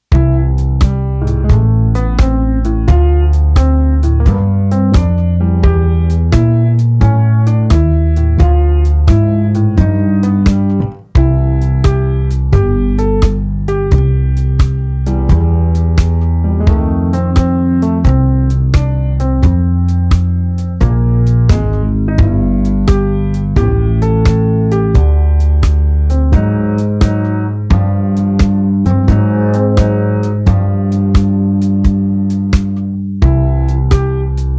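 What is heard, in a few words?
87 bpm, blues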